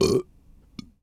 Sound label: burp; AKAI S-Series CD-ROM Sound Library VOL-6; HUMAN SE